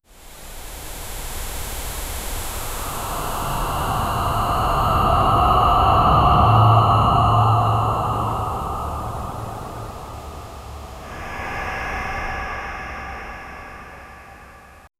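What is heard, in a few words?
an ominous ghostly whisper, paranormal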